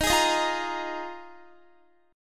D sharp Suspended 2nd Suspended 4th D sharp Guitar; D#sus2sus4; strummed; x,18,18,20,17,17